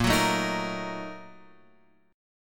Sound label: A sharp Major 7th Suspended 4th Sharp 5th; A#M7sus4#5 Chord {6 9 7 8 X 6} strummed